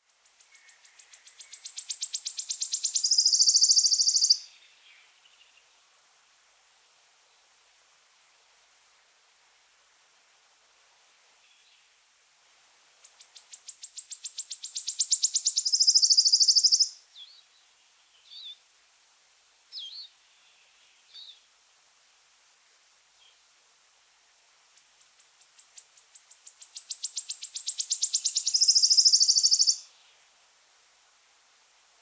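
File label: wood warbler voice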